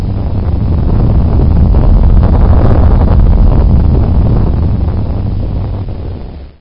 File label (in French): rocket poussee